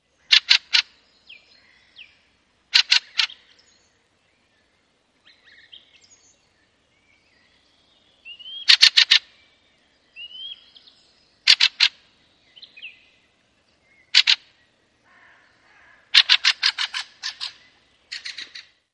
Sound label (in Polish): kwiczoł - głosy wabiące; wroblowe; turdidae; turdus; Kwiczoł jest ptakiem łownym.